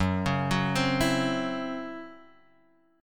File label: C sharp Minor Major 7th Double Flat 5th F sharp, chord